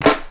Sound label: clp, claps, drum perc; house; PS-28 Vocal House Samples